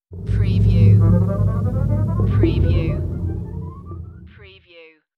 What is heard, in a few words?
Scifi Audio Logo Ident, Ascending Notes, Dark Cave